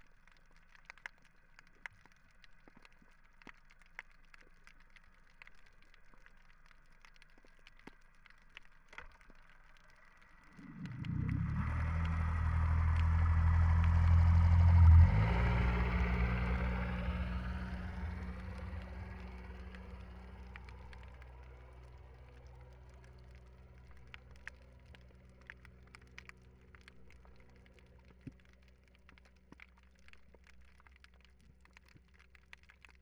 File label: Boat Passage, Underwater; Trieste; B-format